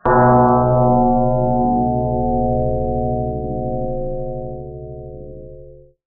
ring bell

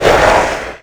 Attack Voice

